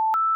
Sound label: success; honeywell